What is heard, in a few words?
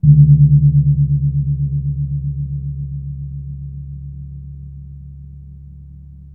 kempur, gamelan; gravityharps